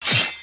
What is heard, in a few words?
slash